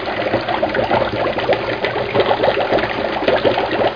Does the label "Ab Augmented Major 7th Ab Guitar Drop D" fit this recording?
No